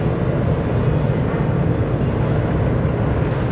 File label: industrial amb; MOTS